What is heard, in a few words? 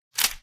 Pistol Wield; Five Nights at Freddy's Final Hours 2